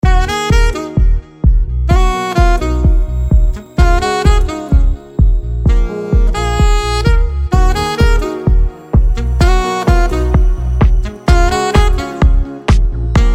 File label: Saxo